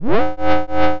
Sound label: Alert, Synth